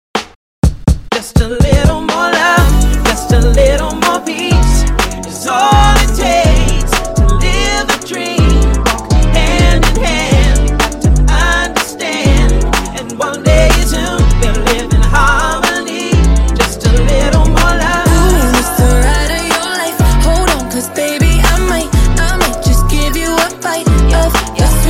Breakz Intro Outro 124 Bpm

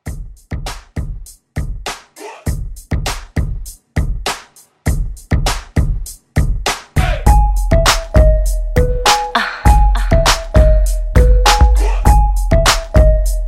2k Hiphop Re Drum 100 CLEAN

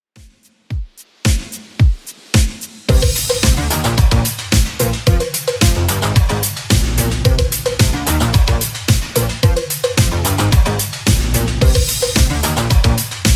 80s Redrum 110bpm

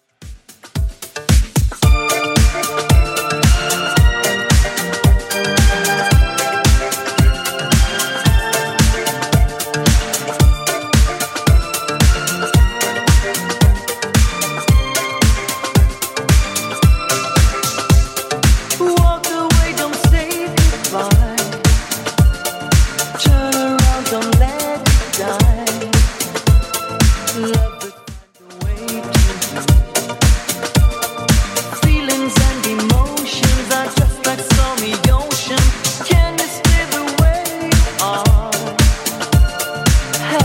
80s Redrum 112bpm

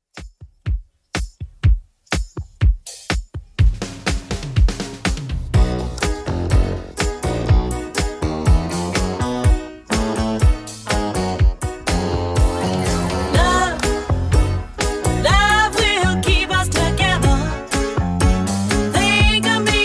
70s Redrum 122bpm

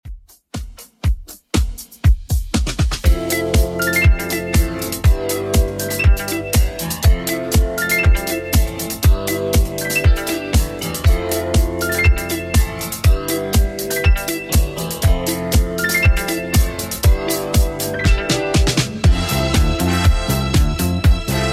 70s Redrum 120bpm

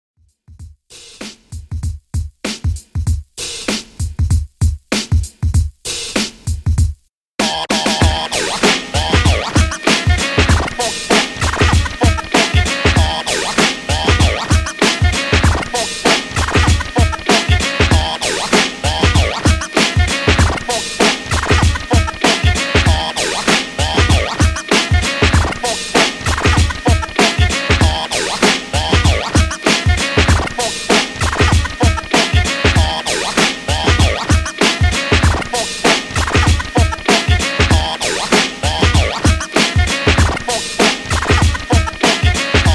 Scratch Classic Redrum 97Bpm

